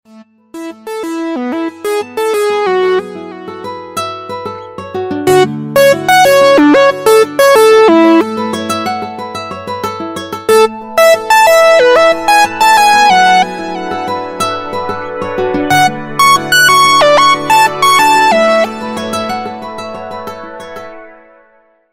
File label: lenovo fantastic clang ringtone